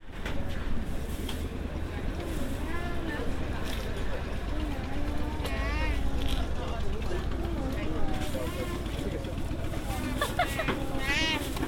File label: near seafood stall on harbour; whitstable